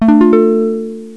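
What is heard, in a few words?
harp chord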